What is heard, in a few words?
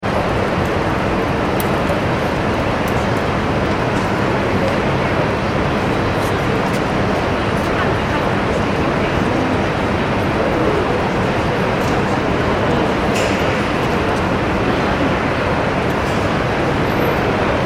ambience urban city street; New York City Street Ambience ( Broad Street ) Medium Busy - People Walking And Talking Wi; Factories; McKinney Sound